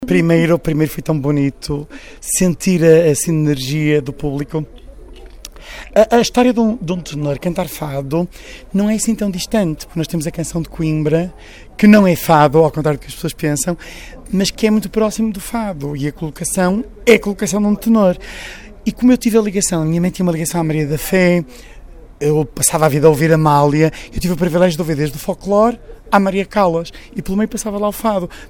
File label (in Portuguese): concertos de bandolins